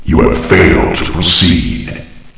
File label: failed